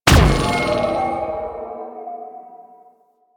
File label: Overguard Pulse Repeat; Warframe; Infested; Ancient; Protector Ancient